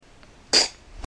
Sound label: silly fart noise